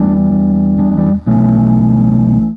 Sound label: Organ